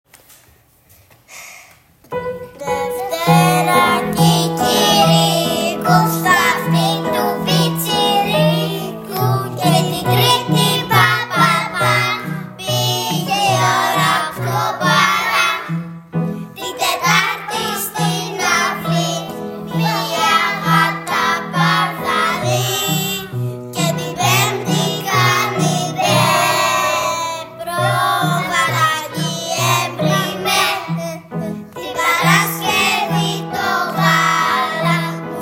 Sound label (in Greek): Kikirikoy